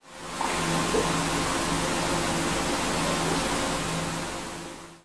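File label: TURBINE; EARTH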